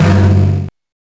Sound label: Timpani; Genesis